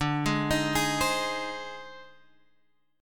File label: Ab Major D, Chord; arpeggiated; Ab/D; JGuitar